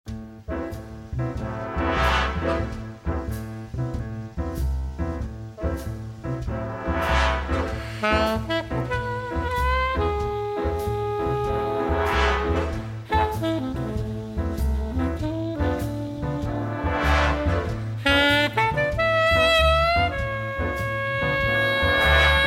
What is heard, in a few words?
Bigband